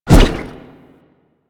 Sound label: Shield Swing; Warframe; Grineer